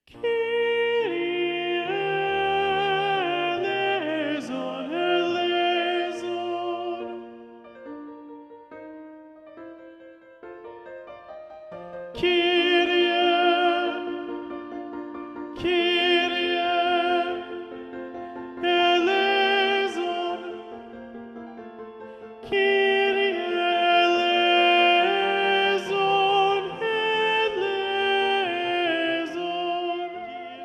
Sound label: Alto Predominant; Haydn; Kyrie (Little Organ Mass); Choral Society of Greensboro